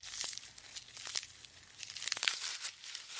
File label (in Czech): žirafa giraffe